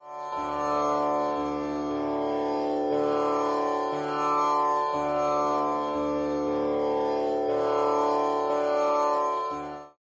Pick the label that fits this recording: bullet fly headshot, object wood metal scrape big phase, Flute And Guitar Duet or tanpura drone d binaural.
tanpura drone d binaural